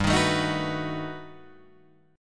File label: G Augmented Major 9th, Guitar, Drop D